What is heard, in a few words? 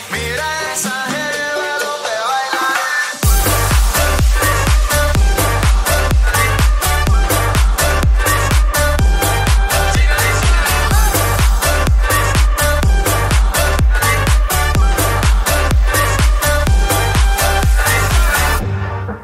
Mariachi Remix